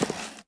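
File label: Wood Slide Small; objects; Star Wars Obi-Wan; XBOX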